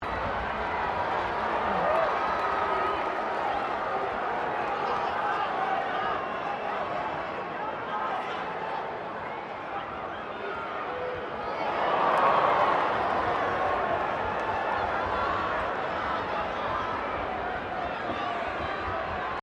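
crowd 15K Rugby World Cup ambience medium loop, Rugby world cup game; Crowd Sound Effects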